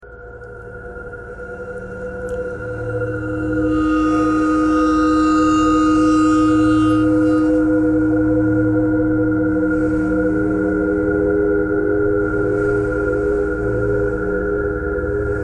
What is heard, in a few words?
ice bowed vibes traffic k core birds thread simple; bowed metal